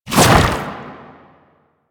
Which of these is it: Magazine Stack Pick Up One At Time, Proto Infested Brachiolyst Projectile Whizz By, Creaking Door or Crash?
Proto Infested Brachiolyst Projectile Whizz By